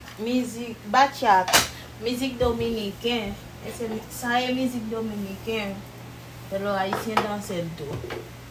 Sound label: bachata; Manglar Films